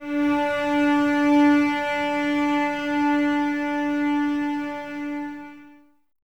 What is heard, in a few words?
Cellos No Vib D 4, String Ensembles; Miroslav Vitous